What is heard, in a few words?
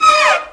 STRING LICKS, Disco